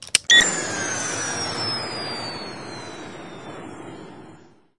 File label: detpack defuse